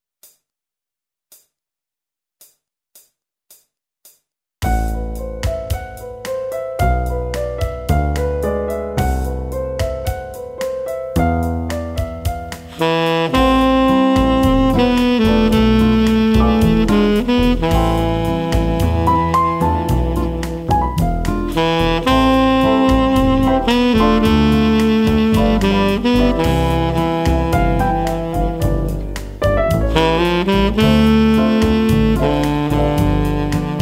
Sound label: Latino; MyVirtualBand